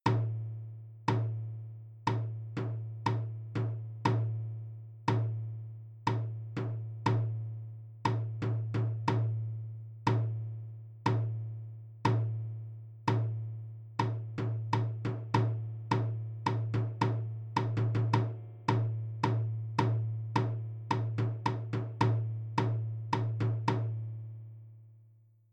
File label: transition practice slow to fast; Rhythm Bootcamp